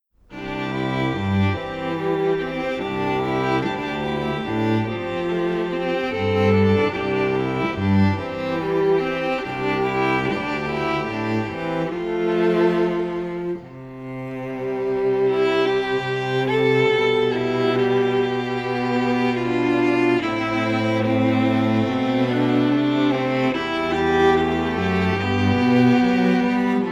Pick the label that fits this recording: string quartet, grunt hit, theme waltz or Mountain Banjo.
string quartet